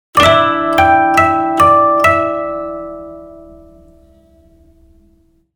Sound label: koto